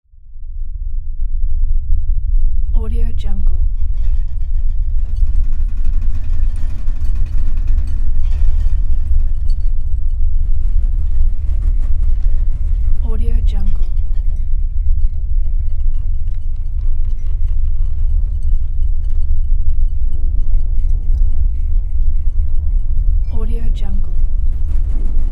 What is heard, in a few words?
interior earthquake rumble with furniture